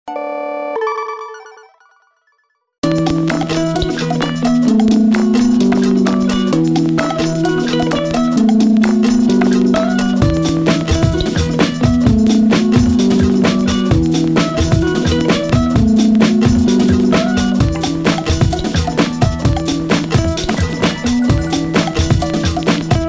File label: Nouveau Noise